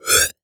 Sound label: Multimedia Internet CD-Rom Flash Whoosh Short